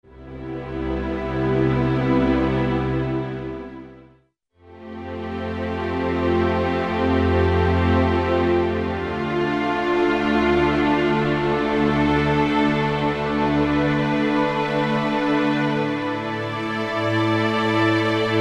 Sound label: Strings Orchestra f Slow; Roland L-CDX-04 Strings And Orchestral Percussion